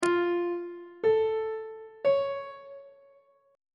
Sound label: f augmented triad chord intervals on treble clef